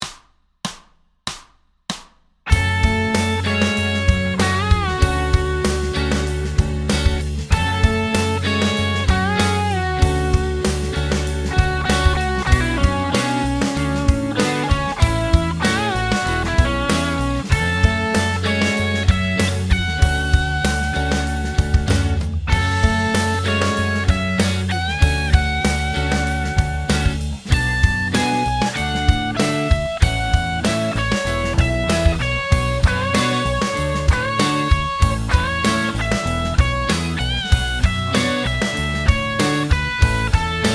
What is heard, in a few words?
solo